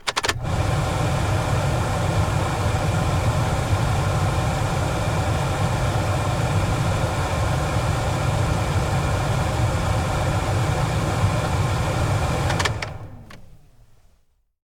renault master f3500 dci135 foley air conditioning high mono; sonniss gdc